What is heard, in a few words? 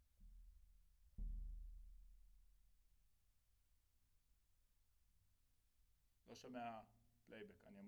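Snare Top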